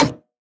Future Tom Perc; House; Drum One Shots; Percussion

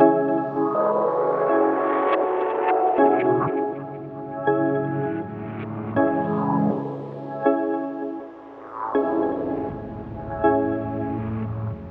161 BPM C# Min Pad, melody loop stem; Trap; Loop Stems; Cymatics; Cadet; 2022 Melody Collection

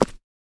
Short foley

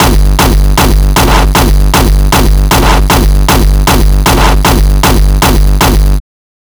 kicks loop 155; GhostHack

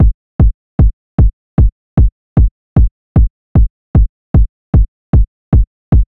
Drum Loop 152 BPM Kick, Hip Hop; Drum Loops; Loop Stems; Cymatics; Truck Drum Loop

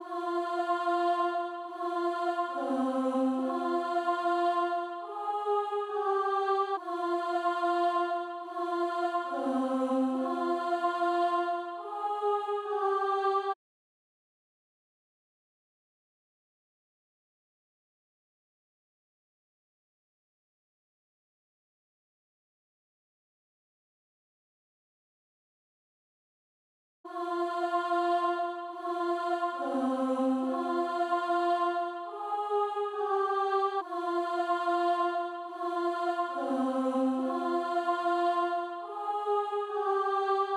142 BPM F Min Choir; Melody; Loop Stems; Cymatics; Missed Payments

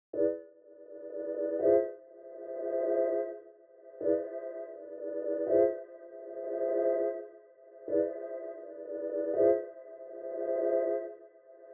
124 BPM A Min Siren Synth; Loop Stems; Cymatics; 2022 Melody Collection; One Over

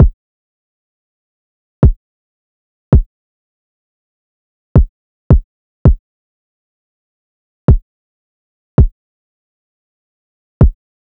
Drum Loop 82 BPM Kick; Cymatics; Vibes II Drum Loop Collection; Distance Drum Loop

